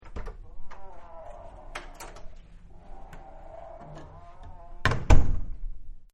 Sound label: Door Open Close; Doors General; Miscellaneous Doors